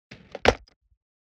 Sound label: landed on the ground